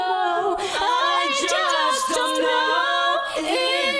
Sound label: vocals; musdb18